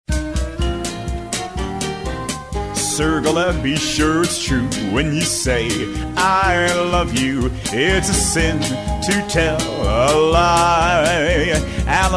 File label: vocal